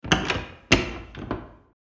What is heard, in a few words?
Large household door open